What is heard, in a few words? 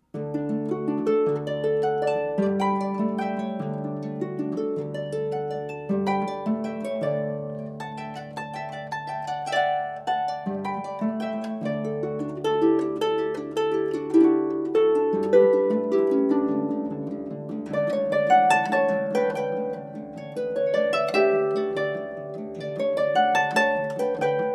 Sonate en Re, Harp; Albeniz; Kirsten Carrell